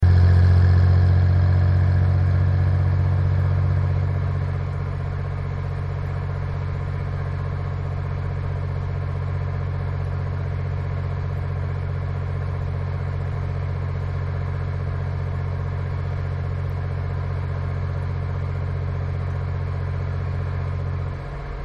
Chevy V-6; Idle Or Slow Steady For; Gradual Acceleration Then Slow, Then Fast Accels. Decelerate To End.; 1983 Chevy V-6